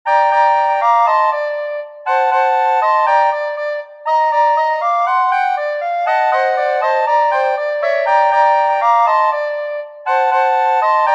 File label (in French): polka